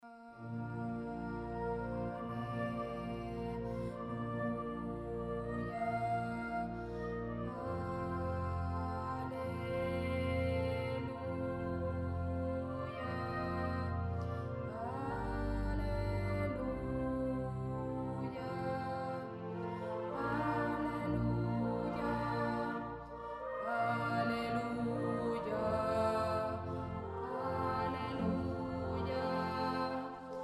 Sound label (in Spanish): CONTRALTO ALELUYA